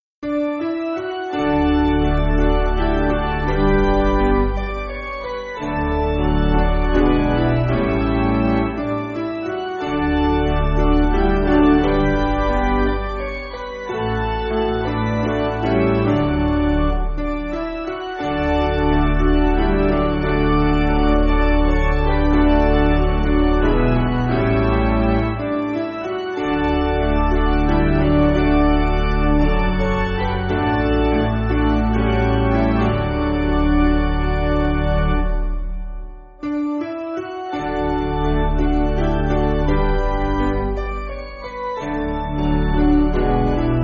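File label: Piano, Backing Files for Churches; I Grieved The Lord; Ackley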